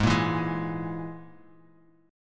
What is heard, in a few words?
G sharp Suspended 2nd Sharp 5th G sharp Guitar Half Step Down